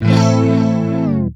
tape fx, scratch; Ambient Grooves

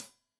Hat Tight, Hi-Hat, Cymbals

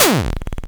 noise 2, sound noise; NOISE+ALARM

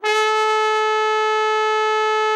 TROMBONE, Killer Horns; Best Service

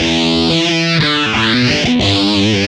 GUI 90 LICKS

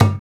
KAKI, African Perc; Spectrasonics; Supreme Beats; African Contemporary; Afro DRMs

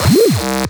8Bit Fx; Antidote Advent

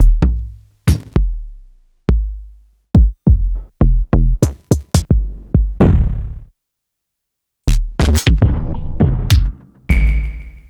Bass Drum; Lost Tapes From Mars

